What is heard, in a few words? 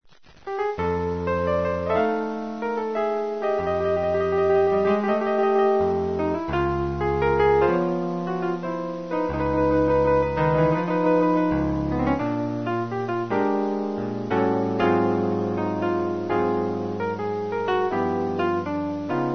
hangover piano, solo piano